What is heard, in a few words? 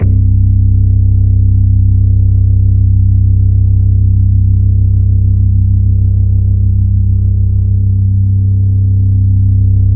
C1, Synth Line; 90 s Sample CDs; NiceBeats; SynthLine VOL-27